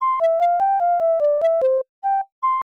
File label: Unused Jingle; Garfield Caught in the Act; Genesis